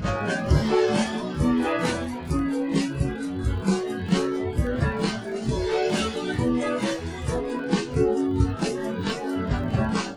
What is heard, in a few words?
piano; sound source separation methods